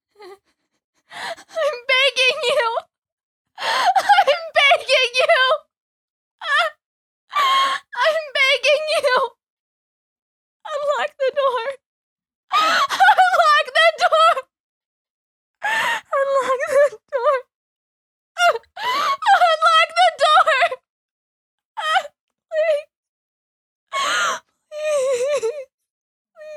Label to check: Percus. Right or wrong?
Wrong